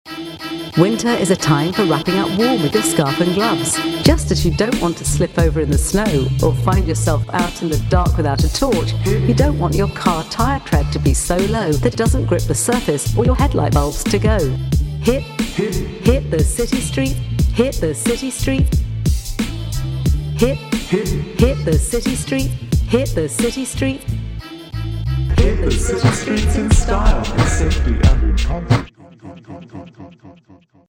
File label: POSH RAP